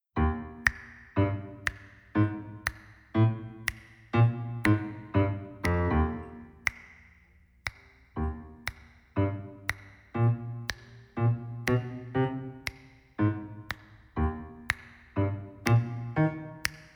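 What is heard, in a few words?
Bb Accompaniment; Peace Like A River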